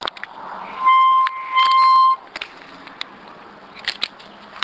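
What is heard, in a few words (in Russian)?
губная гармоника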